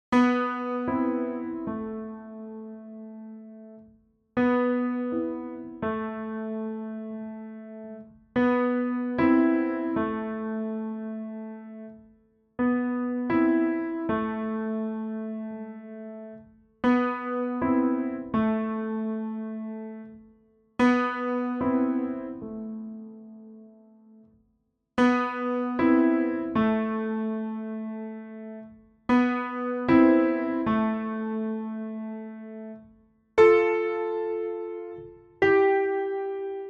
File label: Original Cover (Pian